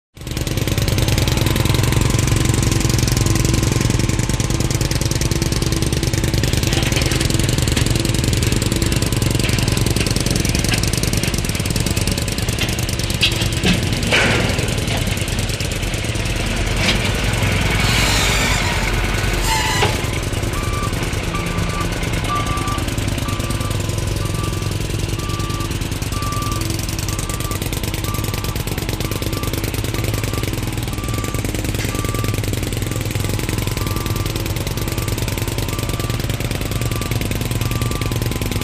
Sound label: In Foreground -jackhammer, Truck Movement / Backing Up, Metallic Squeaks. In Background -saws, Hammering, Trucks, Close To Distant Perspective